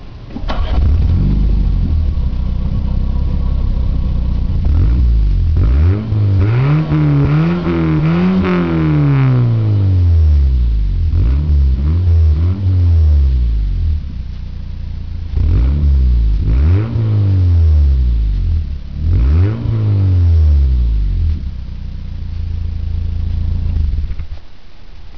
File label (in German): Auspuff